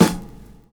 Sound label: VINYL SD, Drums Dance & Groove